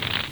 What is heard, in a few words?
flames